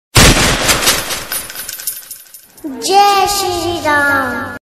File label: jai shree ram notification tone gun sound